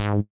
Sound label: G#3 bass